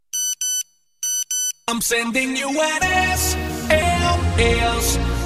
SMS Ringtone (Long)

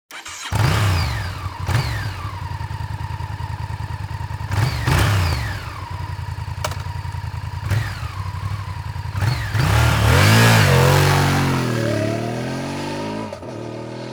Honda VTR 1000 F

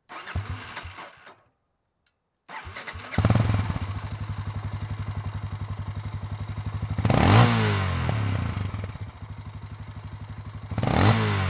Cagiva Canyon 650

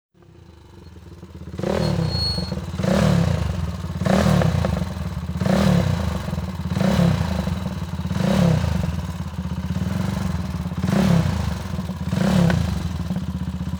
Husaberg

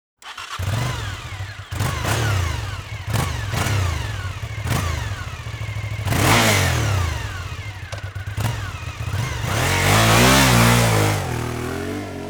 Suzuki TL 1000 S, MC Lyde

